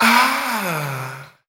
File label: "ah", Voice